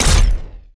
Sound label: Sci misc Sniper Cannon